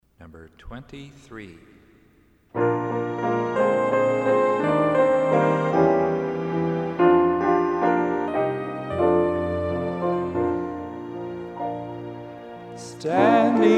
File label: Hymn